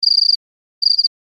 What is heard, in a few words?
ringing tone; Nokia 6270